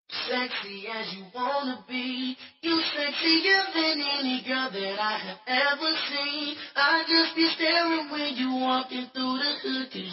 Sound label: female orgazm; ringtones